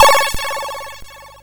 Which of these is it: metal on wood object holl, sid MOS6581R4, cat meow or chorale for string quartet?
sid MOS6581R4